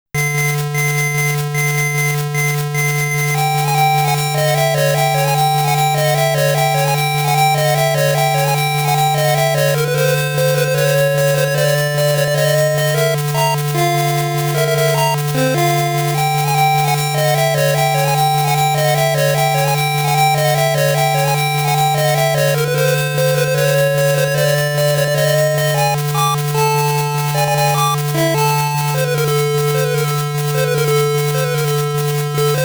BOSS BGM; makaimura ongakutaizen

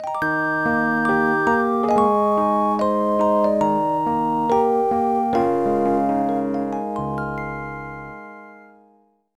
M Sleep; zoo frenzy; dsiware; nds

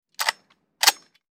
Tactics Sniper Cock; This Is the Police 2

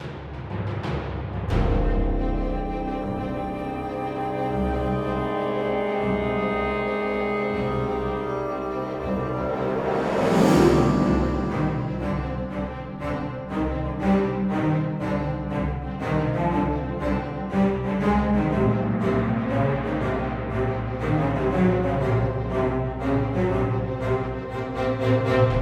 Starfighter Music; Galatic Starfighter

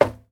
Metal soft; Devour

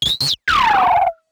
creature; gonner linux macos ps4 switch windows xbox one gamerip 2016

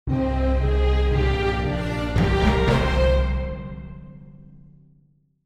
Effects - Quest Completed; overlord raising hell

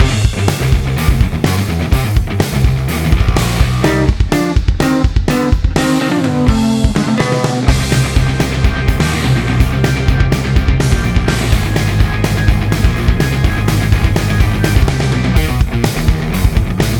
Bass Guitar; Boss Battle; Cadence of Hyrule; Bass Guitarmos Knights Boss Battle (2 Small Knights)

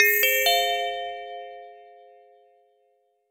Influencer Unlock; This Is the Police 2

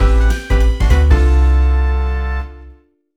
Jig; eigo de tabisuru little charo

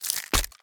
Egg Drop; The Inn

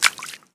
run water

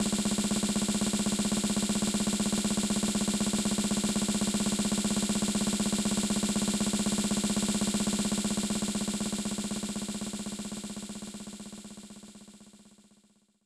Drum Roll; SNES; tiny toon adventures wacky sports challenge

